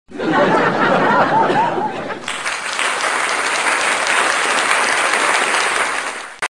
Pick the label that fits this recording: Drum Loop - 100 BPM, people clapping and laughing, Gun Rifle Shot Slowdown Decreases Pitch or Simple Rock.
people clapping and laughing